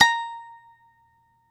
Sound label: lap harp A#4; Ableton Foundation FreePacks; Foundation Glitch Toys & LoFi